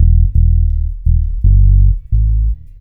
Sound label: BASS LOOP, Ragga & Dub Reggae; USB Soundscan vol.27